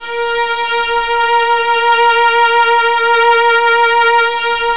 A#2, Virtuoso Strings; ILIO; Kirk Hunter

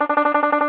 T. BANJO, Tenor Banjo, Plucked Strings

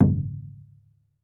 TOLOWA, American Natives, Earth Tones; E-MU Formula 4000 Series Vol. 4

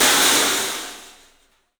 CYFX, EFFECTCYMB; 3D Sound; 3D Drums

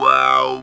TB WOW, Talk Box

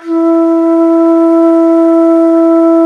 T. BONSUR, India Winds; E-MU Producer Series Vol. 7 – Old World Instruments

